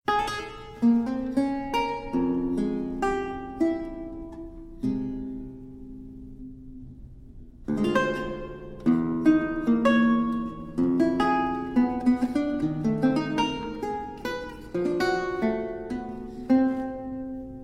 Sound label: lute suite prelude